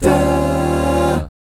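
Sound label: E flat 13, Jazz, 2 GUY CHORDS; ILIO; Vocal Planet VOL-3; Jazz & FX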